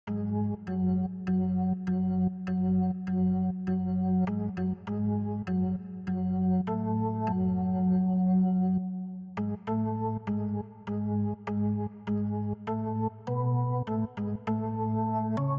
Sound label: organ A 100bpm